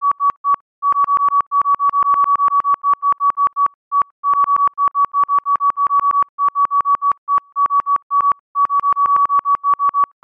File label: science fiction gps receiver signal slows stops; sound effects one